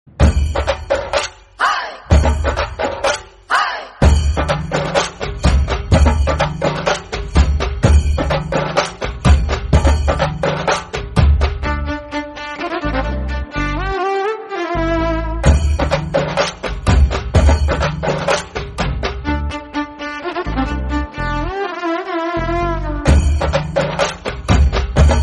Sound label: monsoon raaga instrumental; ragaasudha